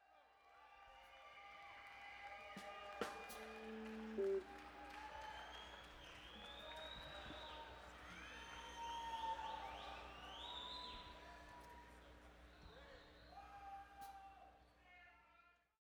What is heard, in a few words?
Crowd